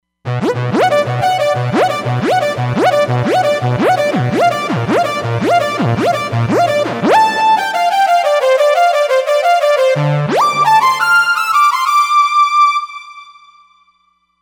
Saw Lead, Roland Fantom XR

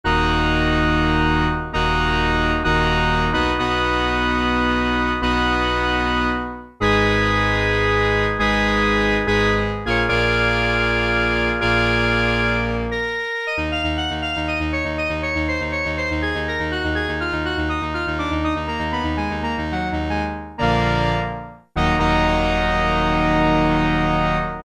Pipe Organ, Korg Poly-61M

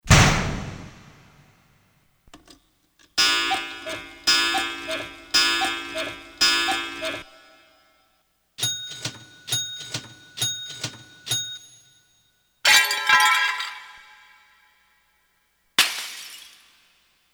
Korg DSS-1 Sound Effects

